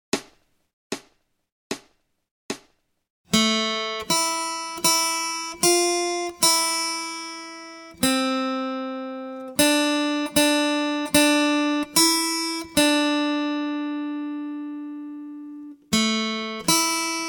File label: Acoustic Accompaniment, acoustic guitar; Grade 1